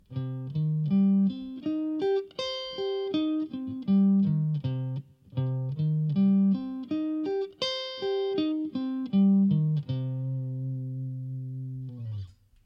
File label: C Minor Arp